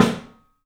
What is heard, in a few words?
PIC SNR, PICOLO ROOM; 3D Sound